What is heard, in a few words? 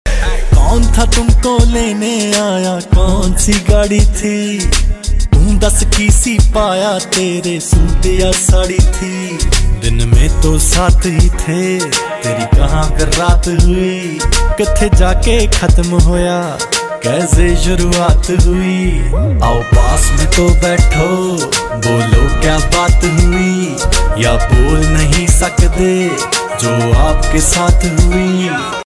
Desi Punjabi Ringtone